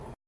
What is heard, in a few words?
Flight ground